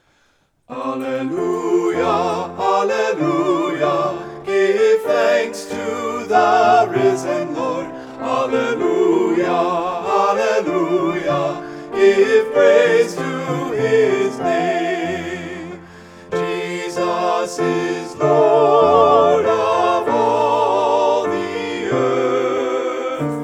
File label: Alleluia SATB; Alleluia No. 1